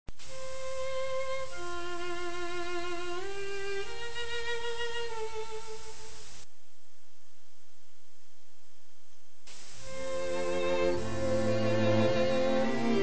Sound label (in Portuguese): harmonizacao